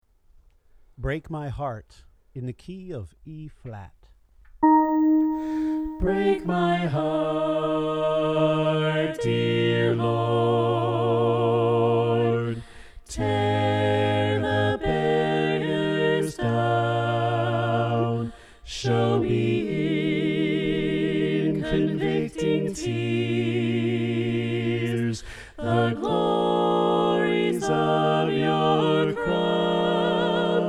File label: Bass Left